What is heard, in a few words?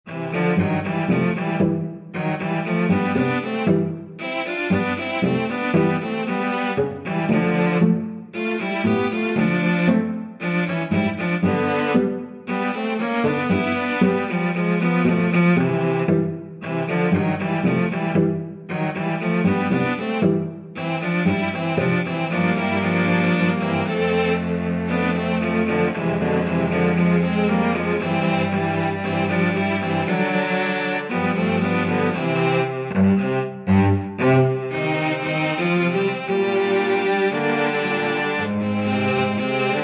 cello quartet